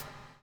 BUBL; Spectrasonics - Backbeat

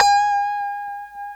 A 5 FUNK POP, GUITARS, FUNK STRAT; Spectrasonics; Hans Zimmer Guitars VOL-1

